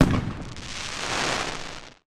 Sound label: tone; Fourth; seasonal